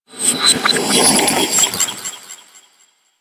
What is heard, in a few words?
Bark; Warframe; Teralyst; Vomvalyst